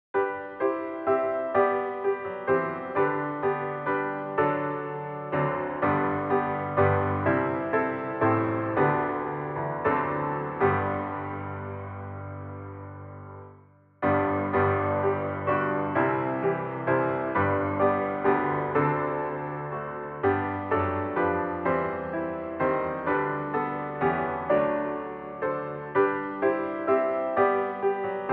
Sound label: From All That Dwell Below the Skies piano